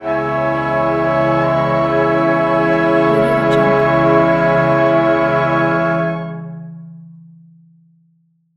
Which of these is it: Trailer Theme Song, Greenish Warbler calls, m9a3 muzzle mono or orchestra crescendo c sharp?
orchestra crescendo c sharp